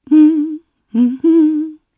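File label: Vox Hum Japanese; Demia; kingsraid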